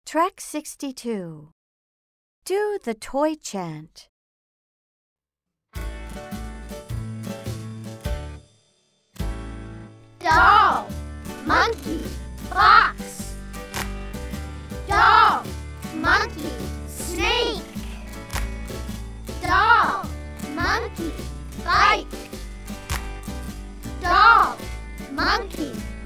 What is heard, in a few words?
toy chant slow